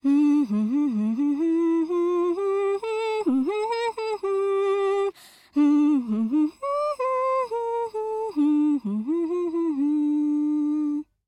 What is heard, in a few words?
Voice song, ja